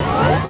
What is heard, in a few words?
door small open